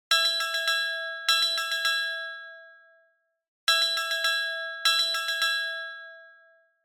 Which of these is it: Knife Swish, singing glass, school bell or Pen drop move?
school bell